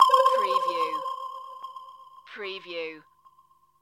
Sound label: Retro Space Echoes